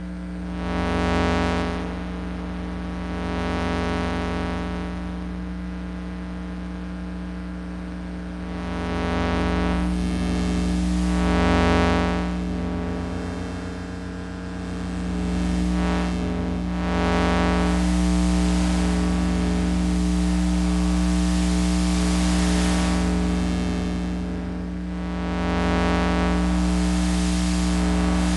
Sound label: tonal noise; Sonniss GDC